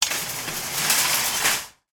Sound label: shopping cart trolley push into others; foley; sound effects; sound effects one